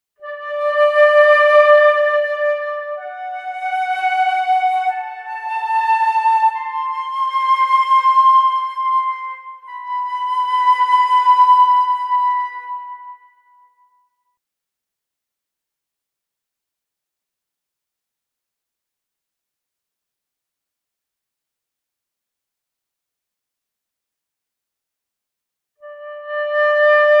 Camp Wind

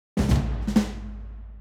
Battle Win; mad crown

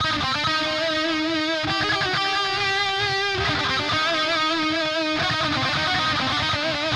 Drums and Jingles Battle Lead; Metal Tales Fury of the Guitar Gods

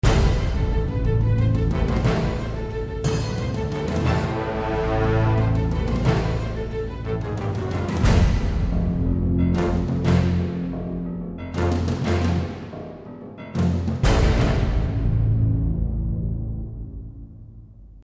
Action

